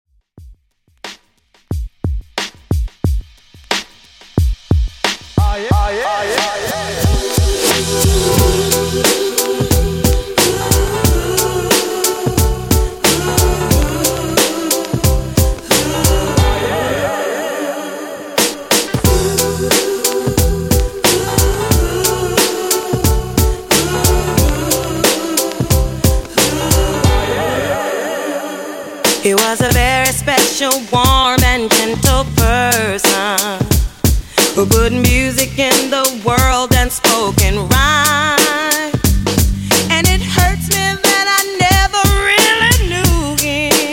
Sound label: 90s Funk Redrum, 90Bpm